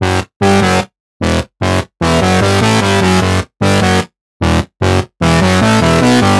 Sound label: Lead Loop F# Wub; Ghosthack